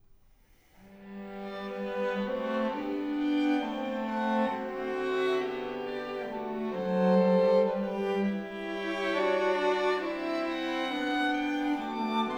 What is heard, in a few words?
String Quartet in C major; Joseph Haydn; String Quartets, op. 76